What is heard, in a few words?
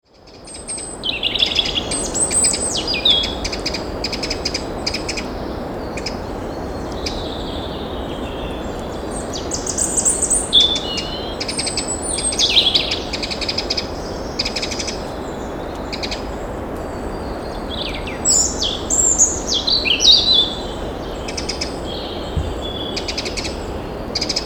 forest birds; Zauberwald